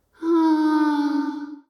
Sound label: Grey Mourner Wake